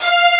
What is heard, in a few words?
slow str, midi; cubic